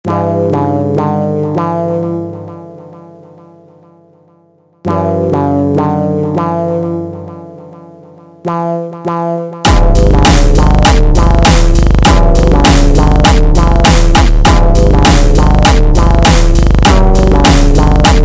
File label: Meow Boss Tekk super Slowed